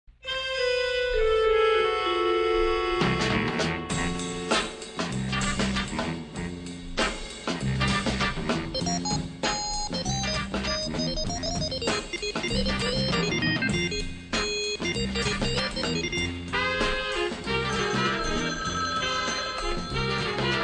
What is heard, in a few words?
music